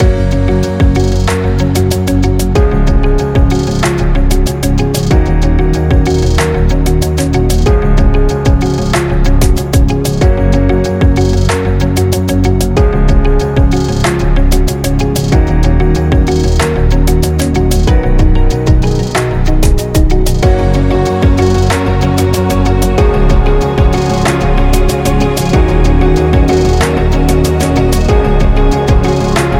Corporate Positive Loop Background

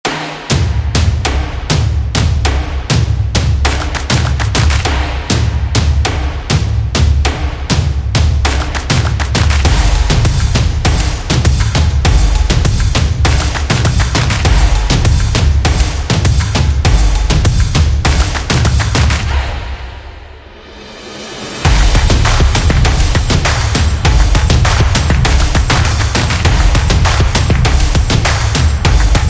Drum Action

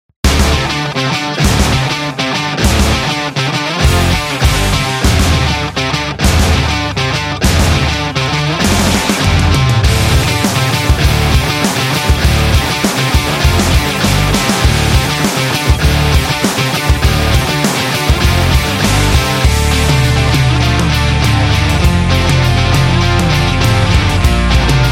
The Sport Rock

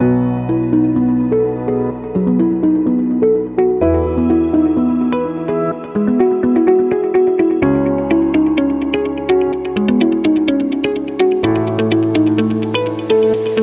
Study; corporate interview

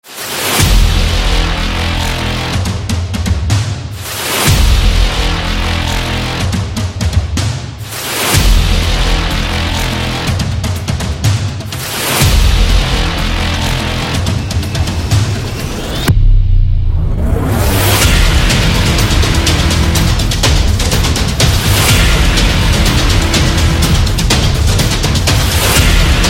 Epic Promo; war drums

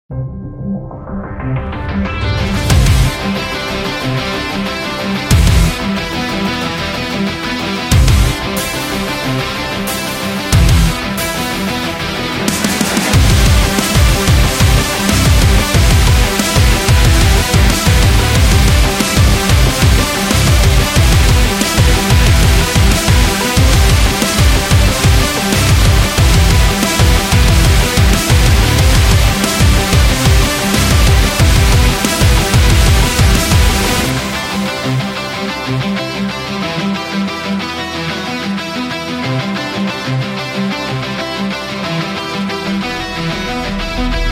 Metalized, metal

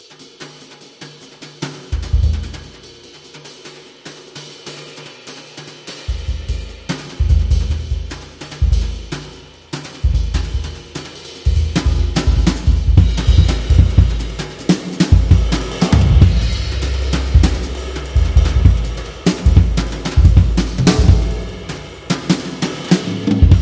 Swing Drums Jazz Solo

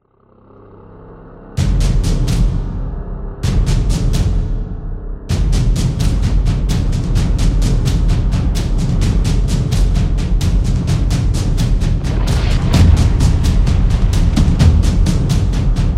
Tribal Drums; suspense

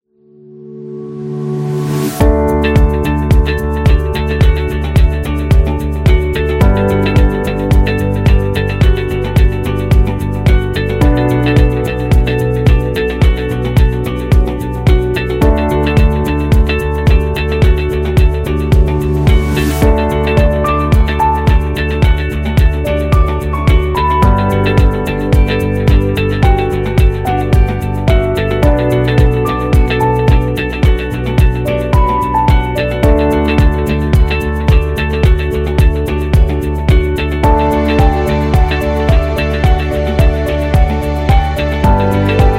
Stylish Soft, corporate music; corporate music vol 18

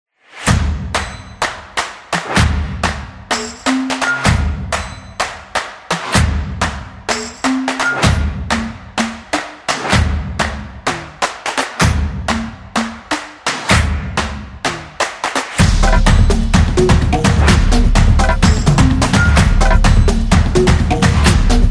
Intro Fun

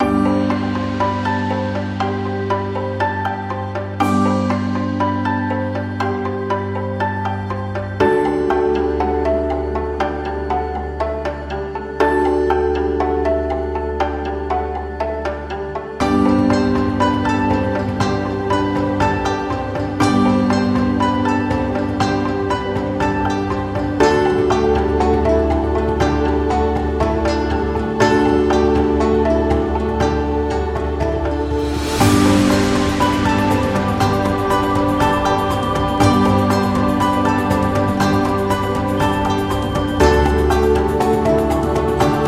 Japan Music

